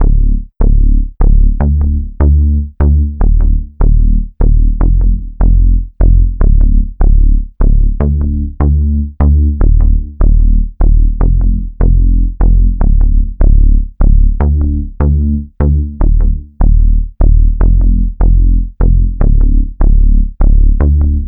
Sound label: Bass, Rock